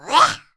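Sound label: MON ATTACK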